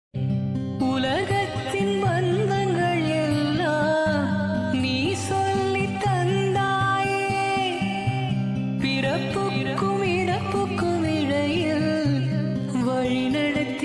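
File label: tamil background music; tamil amma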